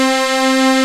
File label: TX UNI C4, SYNTH; AKAI S-Series CD-ROM Sound Library VOL-2